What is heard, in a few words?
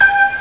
ping; winwands